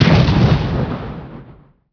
infantry canon; kindjal; Emperor - Battle for Dune

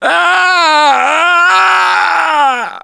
Death Scream, Survivor Voice; Gambler; russian

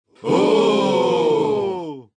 oooh, it; Age of Empires II HD; taunt

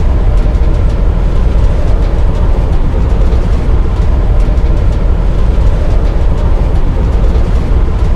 Vent Gust; Duke Nukem Forever

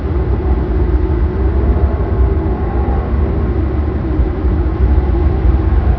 wind loop medium; Emperor - Battle for Dune

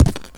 bounce; Ace of Spades

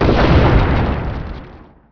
explosion small; Emperor - Battle for Dune

